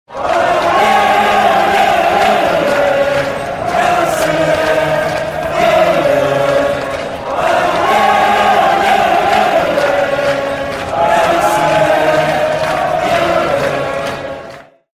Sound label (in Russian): fanaty ole ole ole rossiya vpered; det skaya olimpiada zvukovoye oformleniye